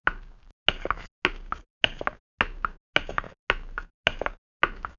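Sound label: sound of walking in wooden, footwear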